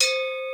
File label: D70 AGOGO; Crypton - DrumBase5000